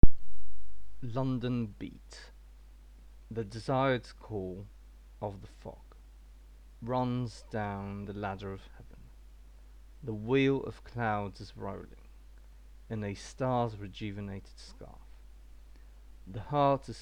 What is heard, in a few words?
London Beat